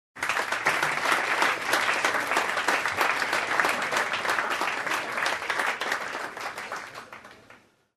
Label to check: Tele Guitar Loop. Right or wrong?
Wrong